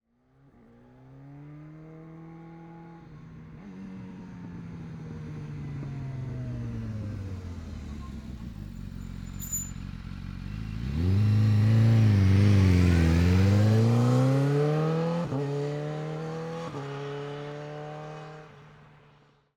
BMW S1000RR EXTERIOR Approach Slow Decelerate Stop Idle Drive Away Accelerate Slow, 4-Stroke 999cc; 2015; Dramatic Cat